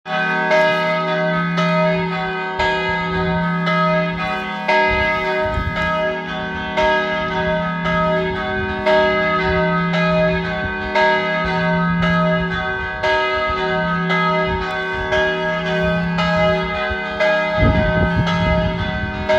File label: Slovenian Church Bells; Tractor